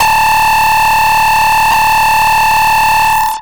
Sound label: ELECTRO EXTRA 140